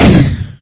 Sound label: laser fire; hl2; entity